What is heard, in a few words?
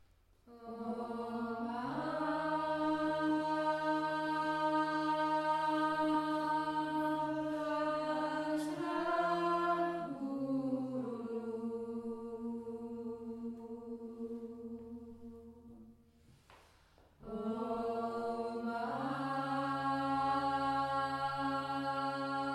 Chant Workshop; Ohm Mah Vashra